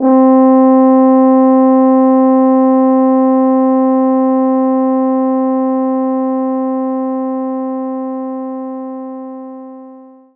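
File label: French Horn mf C3; knights try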